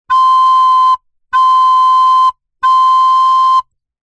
musical instrument recorder 3x toots med pitched med long